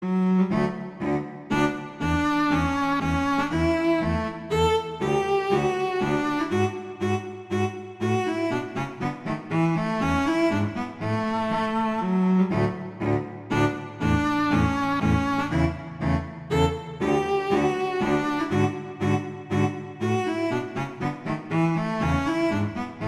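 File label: Duet, Minuetto Allegro, viola; Mazas; Duets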